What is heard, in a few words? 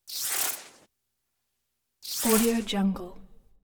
fluttery static pass by